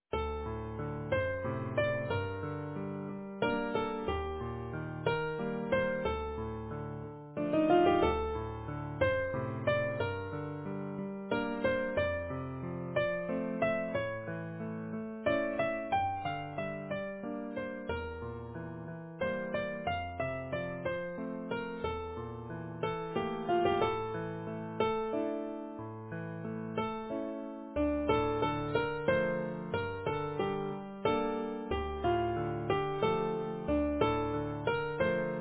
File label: Easy Piano